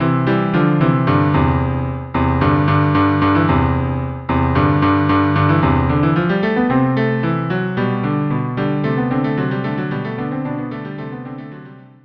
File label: Allegro cello duo